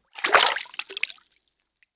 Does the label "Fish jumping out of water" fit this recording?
Yes